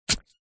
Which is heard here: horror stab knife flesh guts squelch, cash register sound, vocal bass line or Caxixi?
horror stab knife flesh guts squelch